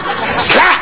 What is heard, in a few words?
slap